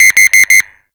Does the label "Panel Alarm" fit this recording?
Yes